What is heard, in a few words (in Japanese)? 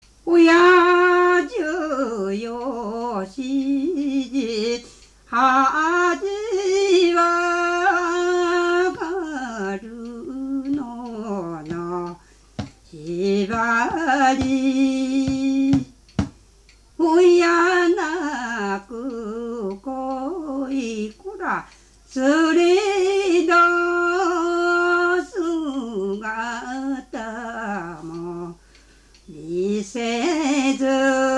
taueuta, minyou